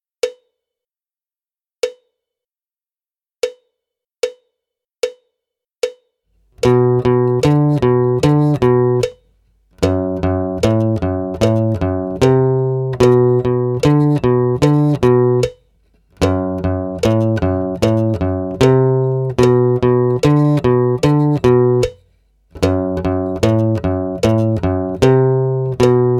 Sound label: Riff Acoustic, guitar